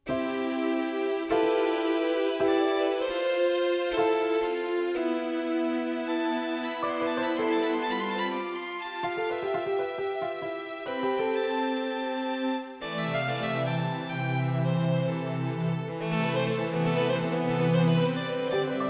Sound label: Violins Of Dawn